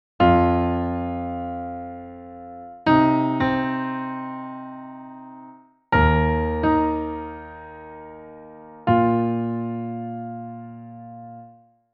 Chorus intervals

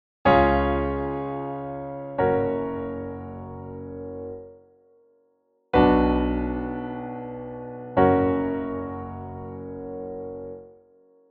two cadences

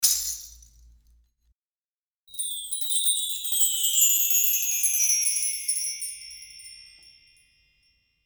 Wet Perc Tambo Chimes

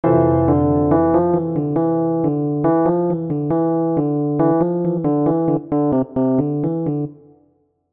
melody intensifies

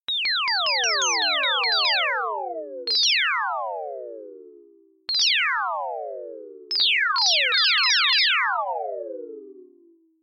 Laser Choir

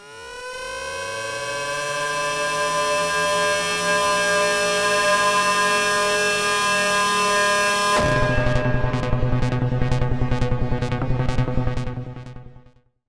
melting siren

